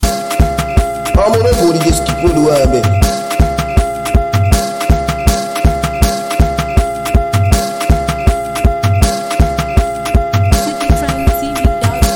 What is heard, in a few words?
Scatter Mara Beat